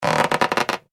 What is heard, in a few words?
balloon rubbing squeak; Balloon Being Rubbed With Hands - Good Cartoon Stretching / Expanding Sound; Funny Sounds; Comedy; foley; McKinney Sound